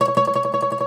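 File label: OUD tremolo, Plucked Strings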